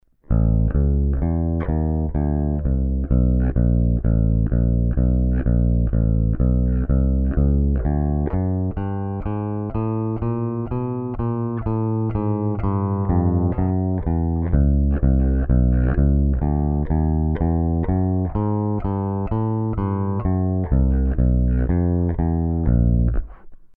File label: BASS